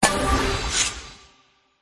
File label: Click Building; the great ottomans strategy battle for throne android